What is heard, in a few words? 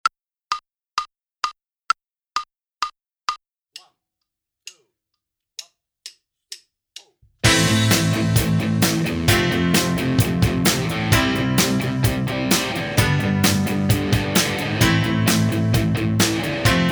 Minus Bass; Inst Ensemble